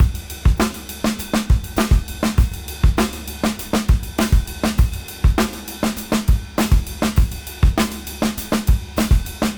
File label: Old School 101 bpm